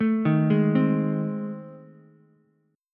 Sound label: A Minor Major 7th Flat 5th A, Ukulele; arpeggiated; AmM7b5; JGuitar